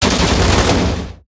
lancer turret fire windup; enemy; turrets; gow

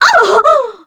succubus hurt strong; queen girl

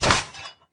armor

